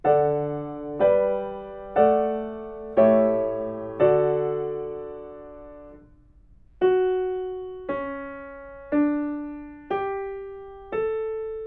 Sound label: melodic fragments